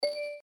Reel Stop; slingo-adventure

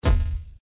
Ball Hits Pipe; slingo-adventure

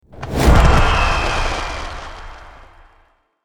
5 Card Stinger Match High; slingo-adventure

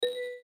Reel Stop; slingo-adventure

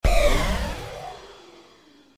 Ball Drops; slingo-adventure

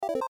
Reel Spinning Loop; slingo-adventure

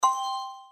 Reel Stop; slingo-adventure

